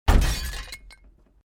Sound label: CRASH VEHICLE SMALL GLASS, vehicle accident sounds; A series of vehicle accident sounds created using FMOD; Crashes